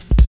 reece steppa cut, drum loops; Zero-G Jungle Warfare 1, 2 & 3

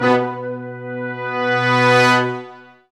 BRS, Lrg Ensemble; Roland L-CD702

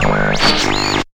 Radio; Jungle Warfare 1&2

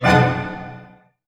F# DIM, Orchestra, ORCH HITS; Sound On Sound; Peter Siedleczek's Orchestra

